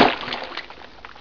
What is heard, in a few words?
splish; mk2